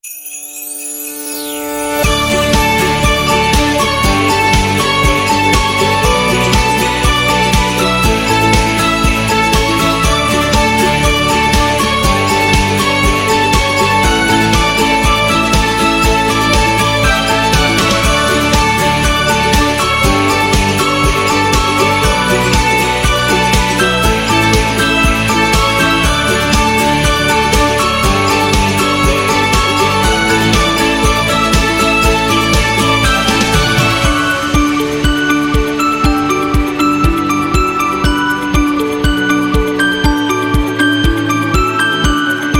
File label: Christmas Celebration